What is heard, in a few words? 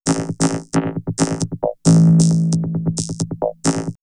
Vocoder Loop; X-Static Goldmine; VocoderLoops